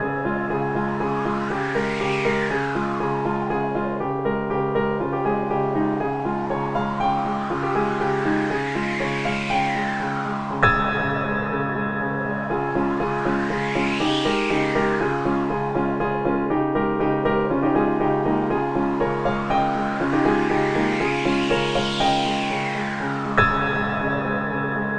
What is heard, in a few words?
music stormy